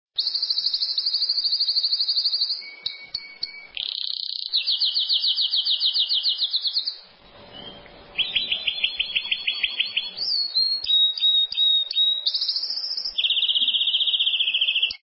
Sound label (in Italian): canarino